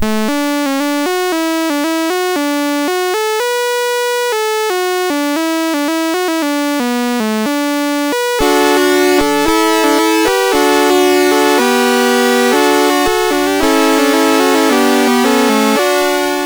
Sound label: BASIC, MOS6581R2